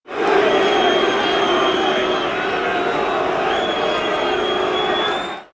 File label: big boo